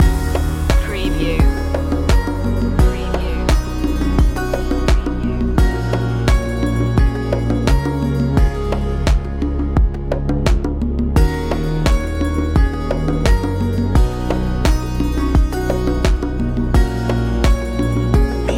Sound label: punch your ticket loop